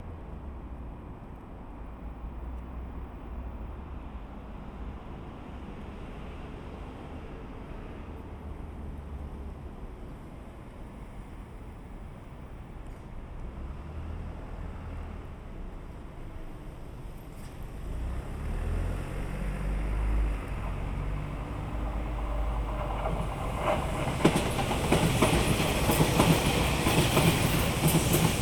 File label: Binaural, walk; Field recrding walk